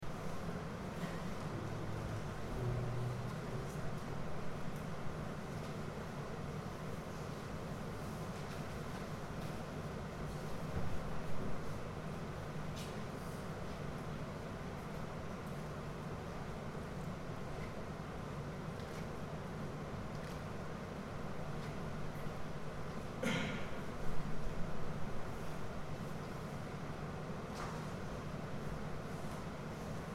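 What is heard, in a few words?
Silent night, carols; Crook carols 2023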